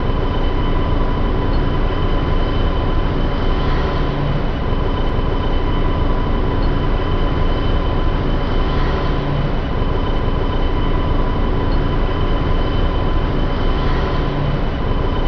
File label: tram idle; sligwolf